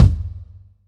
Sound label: big steel kick drum g key, Royality free steel kick drum sound tuned to the G note.